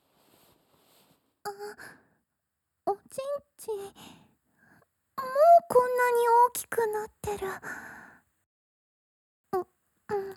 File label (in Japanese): 一緒に寝よ フェラシーン; 一緒に寝よ（フェラシーンサンプル）; Sound Carnaval過去作品サンプル; 妹は恋人～お兄ちゃんの精子いっぱいちょうだい～